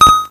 ding

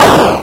fire

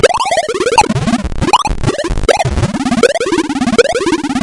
crazy

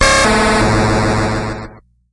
clang

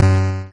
fling

